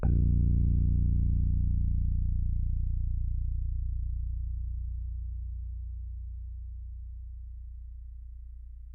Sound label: A#0, Rhodes Mark II; mkII 88 key MID; CEPCo Sample Series Vol. 3